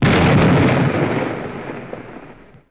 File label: Explo Med; impacts explos